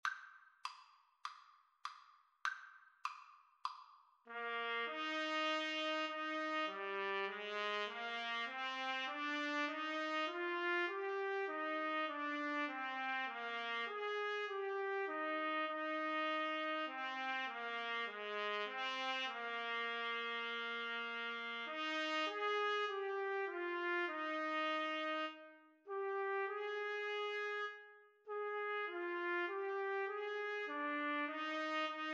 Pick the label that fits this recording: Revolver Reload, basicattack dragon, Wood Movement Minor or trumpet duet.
trumpet duet